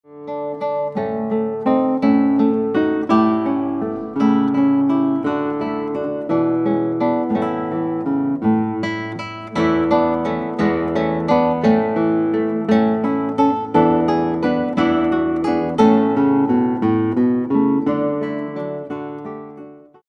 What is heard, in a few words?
Guitar